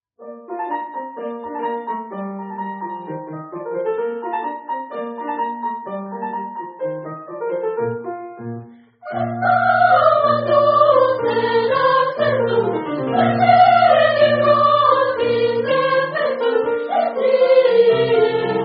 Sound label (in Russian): Хор старших классов; Дж.Б. Перголези; Stabat Mater N11